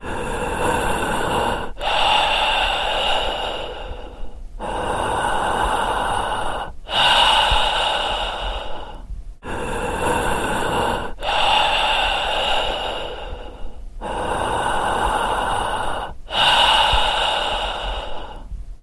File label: Breathing